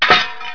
clang; set type

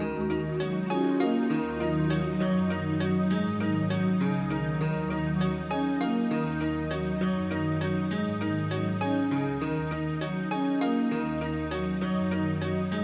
future Loop; SGI O2 Out of Box Experience 2.2

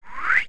Swish

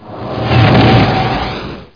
FLYBY; Duke Nukem 3D Kill-A-Ton Collection

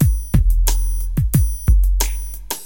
DM LOOP, HipHop, Drums; LiveAct Pool

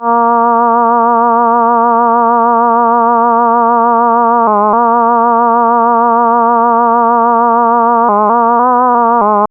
synth; maudio; Latin Street

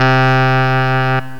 Tenor Sax, Reed; GMPlay

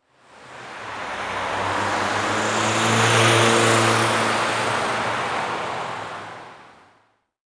traffic

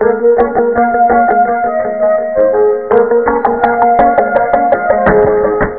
intromusic; buzzbar

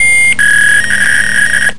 fax

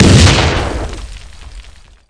grenade explosion; Soldat

